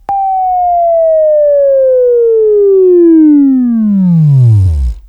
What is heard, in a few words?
Buzz; Labview-Program; Sweeping Training program